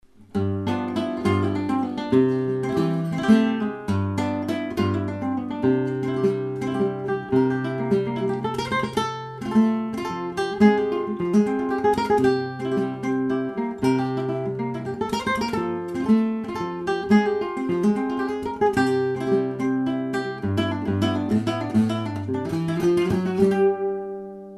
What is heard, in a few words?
guajira, flamenco